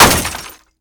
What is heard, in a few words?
break